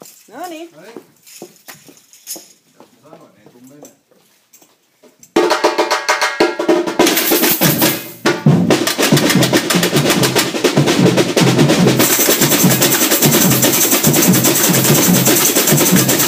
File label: tatatapum, bateria